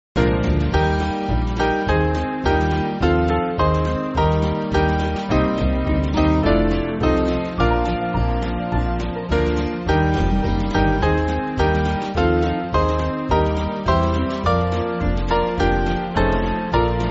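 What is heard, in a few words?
Band, Music Backing Files for Churches; From Thee All Skill; Land Of Rest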